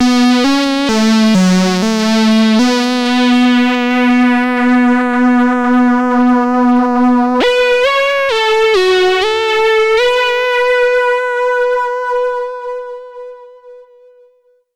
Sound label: filter LP; russian